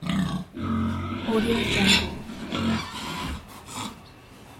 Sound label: animals pigs grunt squeal